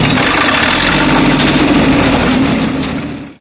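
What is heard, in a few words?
digger throttle on; vehicles; hl2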